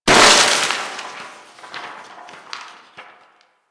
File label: Desktop Book Destroy; Warframe; Ambience; Entrati